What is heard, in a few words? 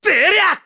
Sword Spin; Link; zelda sounds; Skyward Sword